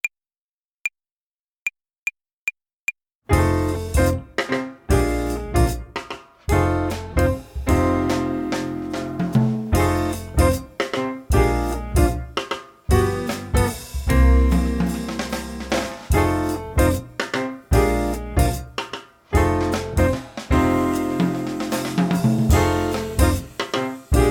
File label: Play-along, Jazz Club Violine